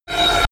sci-fi laser shot charge short; soundpool

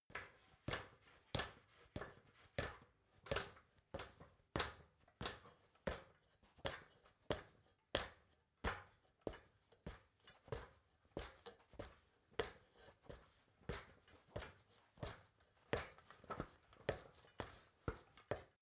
step ladder metal medium

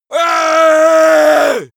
male battle cry; schreie; menschen

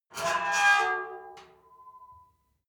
metal squeal saturated short

